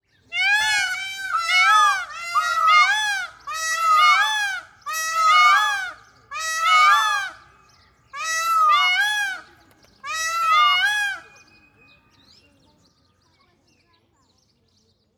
Peacocks Singing; افکت صدا حیوانات; Animals

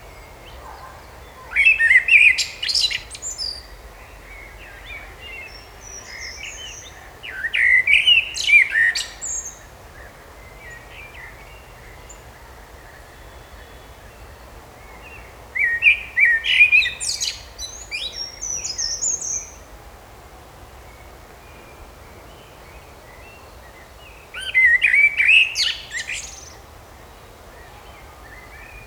Singing Birds in Park (Loop); افکت صدا حیوانات; Animals

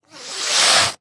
Cat Hiss; افکت صدا حیوانات; Animals

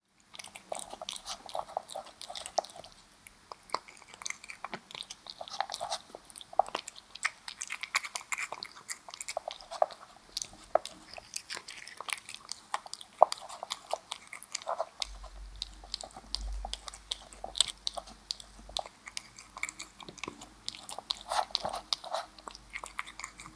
Cat Eating Wet Food; افکت صدا حیوانات; Animals

